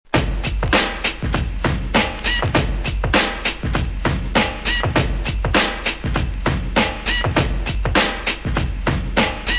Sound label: drum loops